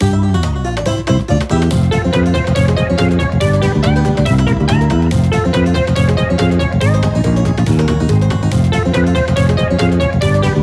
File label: FISHING